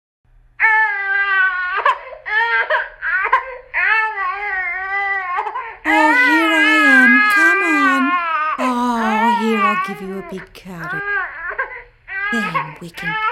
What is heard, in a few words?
crying soothing